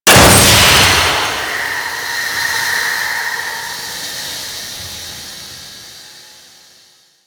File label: Cryo Pod Damage; Objects; Defense; Warframe